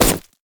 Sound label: jester basic attack; LoL; shaco